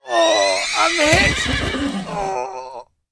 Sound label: horseman die; propack